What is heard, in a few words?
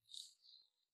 vocals